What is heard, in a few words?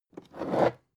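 aluminium case small handle drag short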